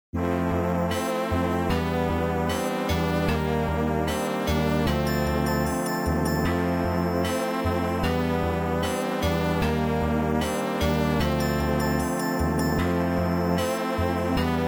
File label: Continue; Dragon Spirits